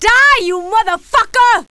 female die; kingpin loc

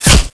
bullet hit

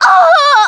FEMALE DEATH; combatarms

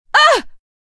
HURT PAIN A FEMALE; combatarms

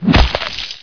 claw strike; justislave

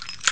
ammo; kingpin

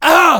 HURT PAIN MALE; combatarms

